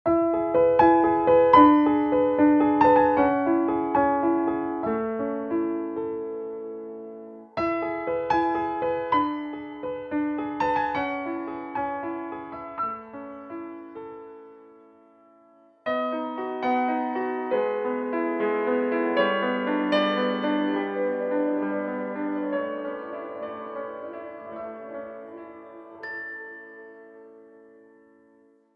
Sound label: Piano in the sky